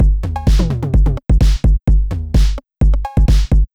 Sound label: Snare Drum Cowbell